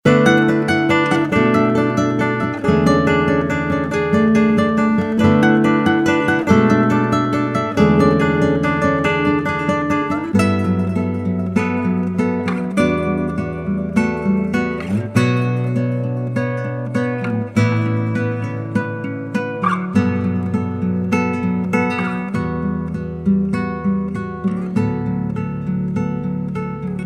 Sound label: Chilled Guitar; Vanilla Groove Studios; acid